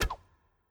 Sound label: Amazon Attack Shoot